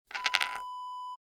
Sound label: bottle cap spin